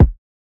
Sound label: 90bpm Fmin Kick